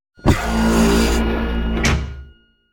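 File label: Door Open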